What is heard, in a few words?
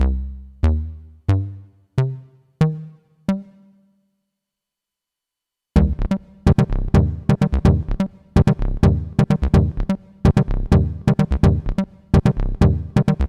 casio xw-g1 arp funky ep